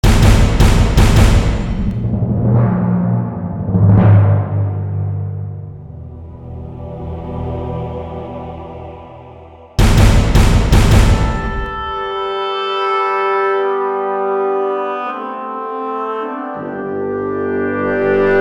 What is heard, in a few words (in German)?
Getragene Fanfare